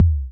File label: Kicks, Elektron MachineDrum; SPS1 MKII